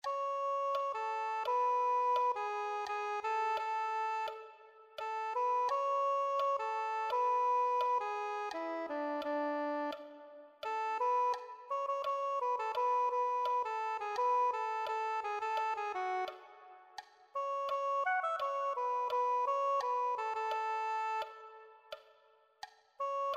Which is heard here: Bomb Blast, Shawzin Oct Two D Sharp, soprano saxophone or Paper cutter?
soprano saxophone